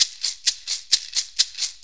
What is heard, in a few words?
Maraccas, Perc Loops, 4-4 Drum Loops; Drum Tools